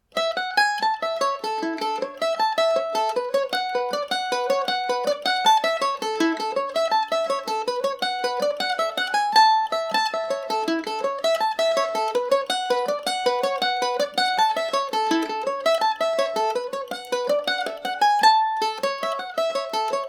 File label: mandolin; cardiac hill; recorded January 2021 on my G&O #34 using a Zoom H1n digital recorder